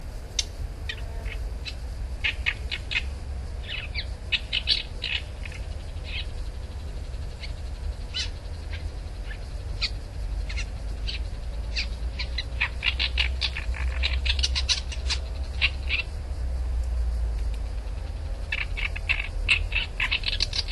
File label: bull shrike